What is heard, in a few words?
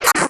invalid switch; Candy Crush Friends